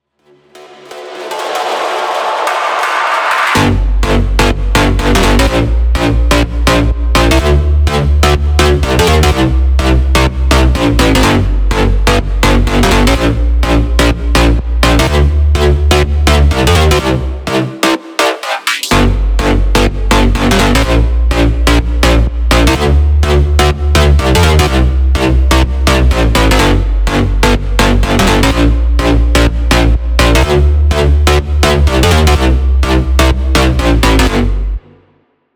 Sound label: future house bass